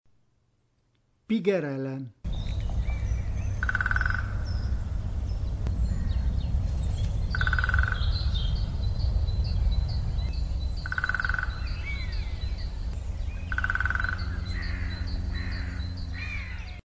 Picchio rosso maggiore

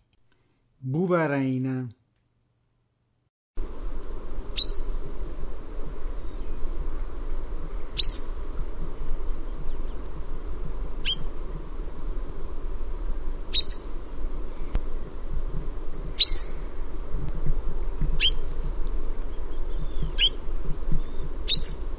Cutrettola

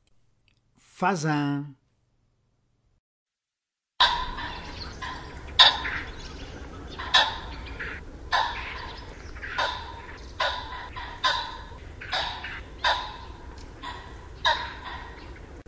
Fagiano

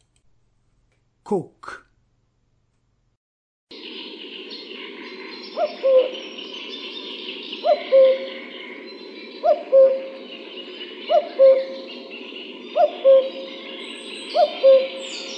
Cuculo

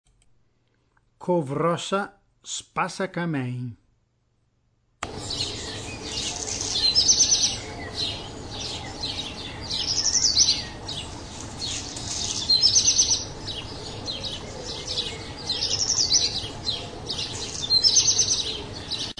Codirosso

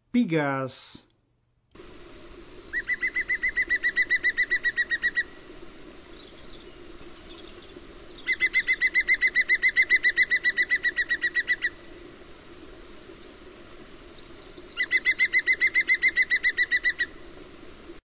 Picchio verde

